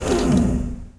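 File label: door door2 open; 星际争霸音效-misc-door-door3opn; 星际争霸其他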